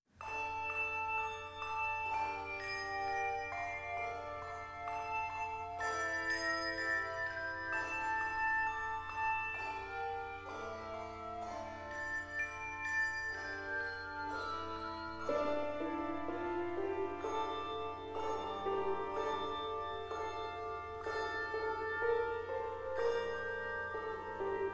Handbell Music

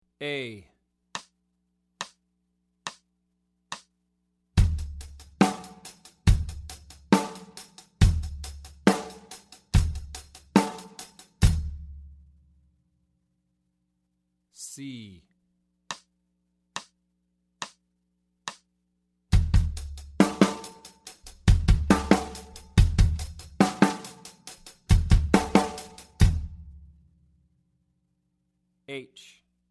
Basic Sixteenth-Note Blues Beats, jazz drumset

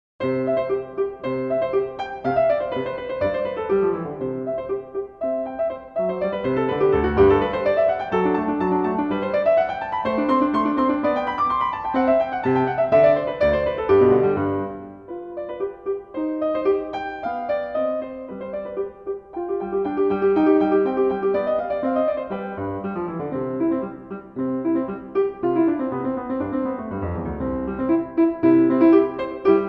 Sonatina No. 1 Allegro, Piano

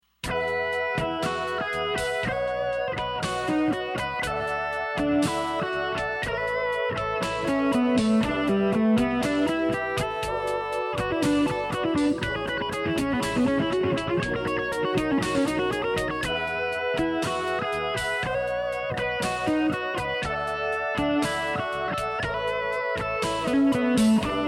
Maj 7 Chords, Jazz Guitar

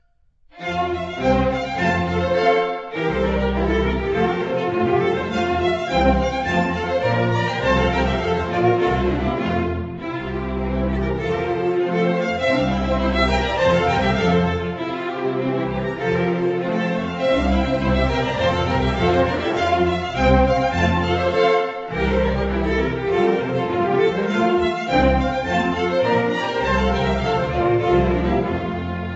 polka and waltz; wingert jones music

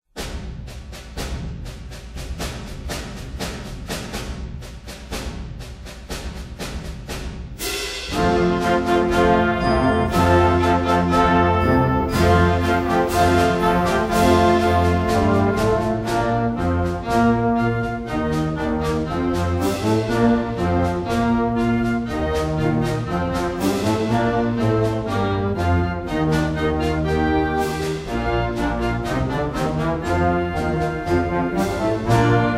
march, Concert Marches

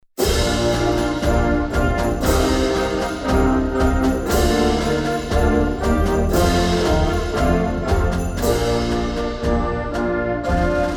fanfare; wingert jones music

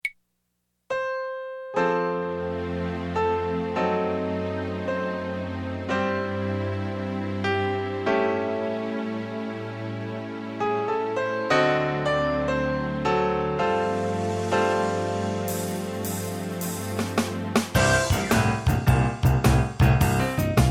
Americana Blues, piano

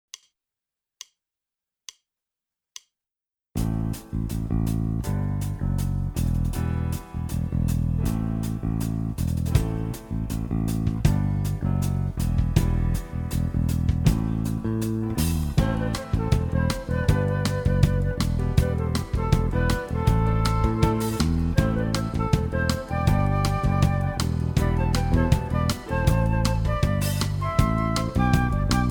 Orchestra, classical guitar

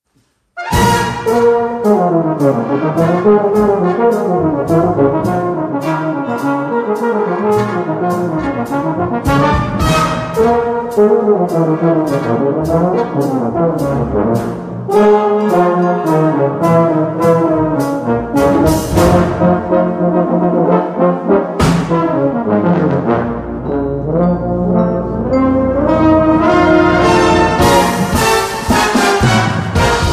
Euphonium & Baritone Section

